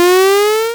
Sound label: powerup; OpHog